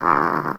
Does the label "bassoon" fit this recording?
No